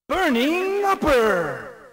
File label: special attack; RedDot